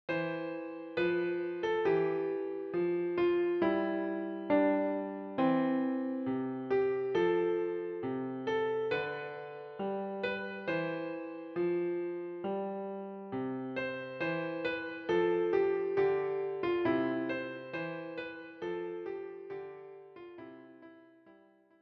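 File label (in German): Joy to the world Klavier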